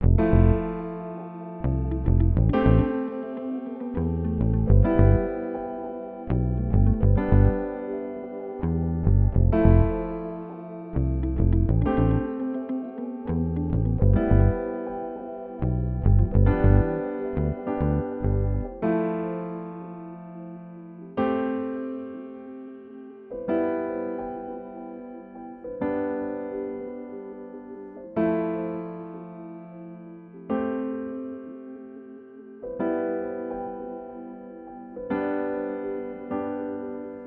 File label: 103 BPM C Maj, Melody Loops - Afrobeat; Need U